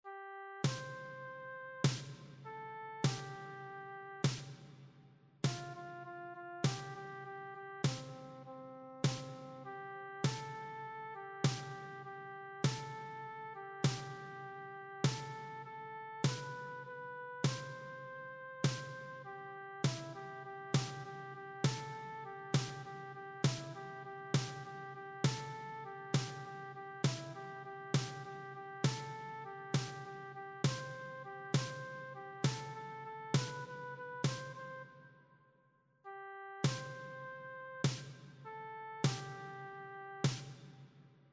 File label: PERC; Martín Martín